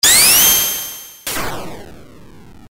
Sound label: spin